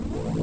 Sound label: air; madcars2; radicalplay